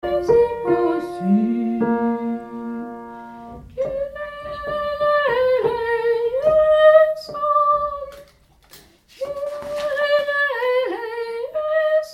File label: Kyrie Tenor